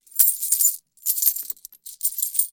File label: Coins Jingle